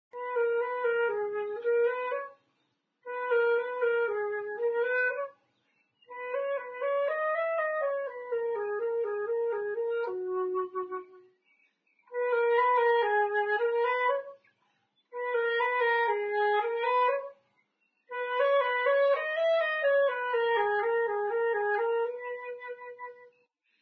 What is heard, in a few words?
Flute, The Flower Duet; Instruments; Zomboid; Lifestyle